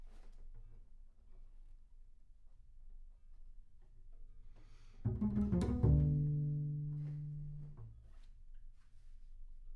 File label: Bass Room